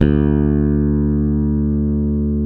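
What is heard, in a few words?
SB D1 HARD, SPECTOR BASS; Northstar - New Gold VOL-1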